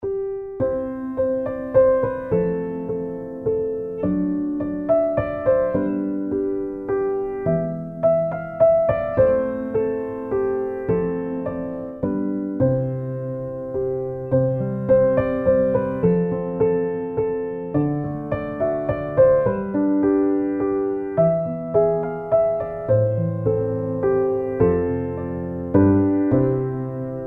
We Wish You A Merry Christmas Piano

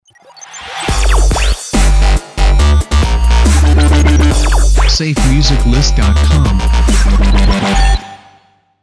Dubstep Audio Logo; SafeMusicList

